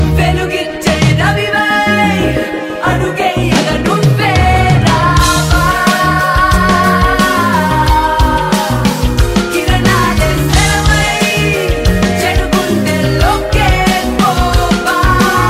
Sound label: Telugu Ringtone